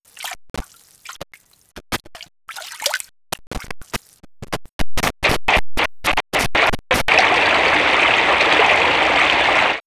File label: Nature Small Splash; X-Static Goldmine